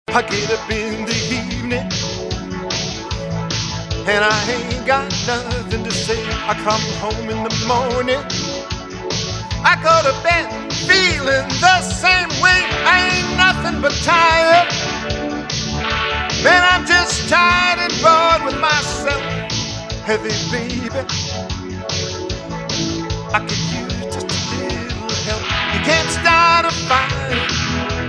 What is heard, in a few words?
Rock And R And B; cabaret singer